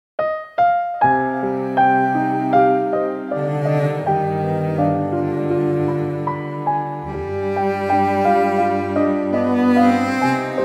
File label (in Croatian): instrumental